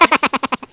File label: Cartoon Laugh